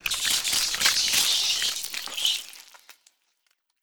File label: Vampire Bats Attack